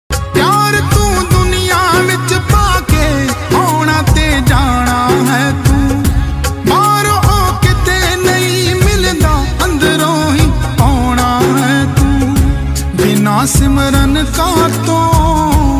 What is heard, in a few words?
dharmik punjabi